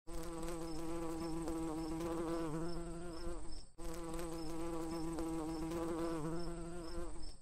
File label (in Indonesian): Lebah